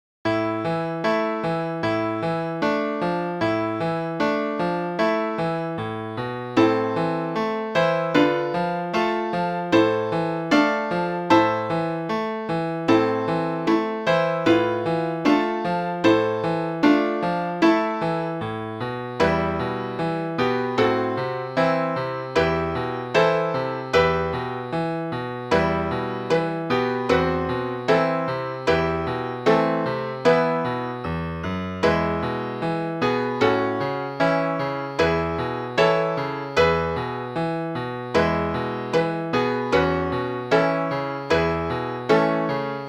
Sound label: Sad & Happy piano